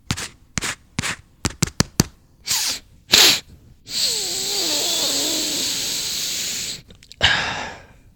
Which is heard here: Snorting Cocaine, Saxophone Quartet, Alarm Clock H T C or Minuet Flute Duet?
Snorting Cocaine